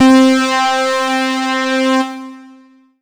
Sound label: Ensoniq SD-1 Lead C6